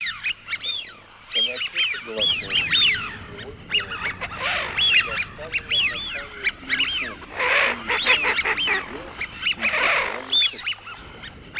Свиязь Fischione; plurifon

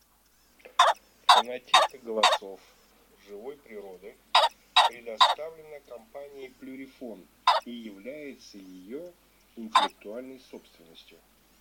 Фазан петух Fagiano; plurifon

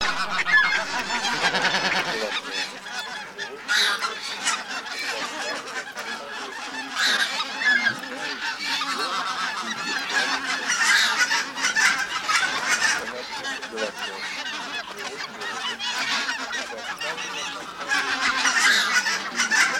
Гусь базар Oche Mix

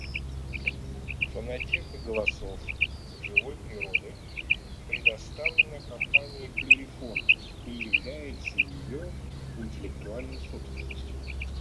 Перепел Quaglia

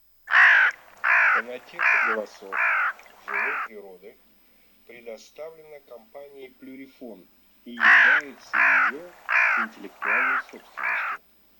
Серая ворона Cornacchia; plurifon

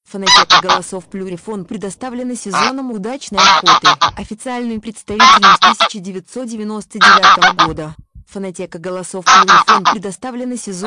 серый гусь; plurifon

